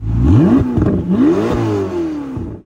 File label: engine rev